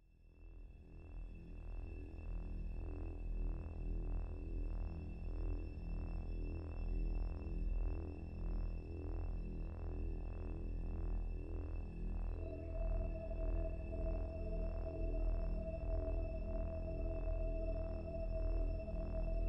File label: Wake up Call